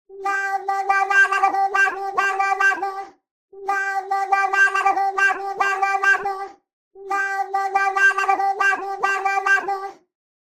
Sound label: the cat tuned